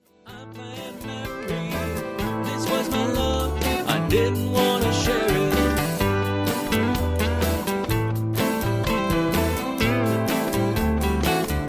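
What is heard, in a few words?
Instrumental with background vocals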